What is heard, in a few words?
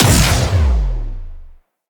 Melee Heavy Air Slam Impact; Whooshes; Jump Slam